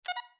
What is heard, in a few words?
Beep; Star Trek